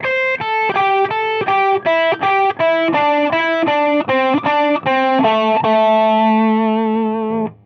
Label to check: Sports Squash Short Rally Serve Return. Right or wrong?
Wrong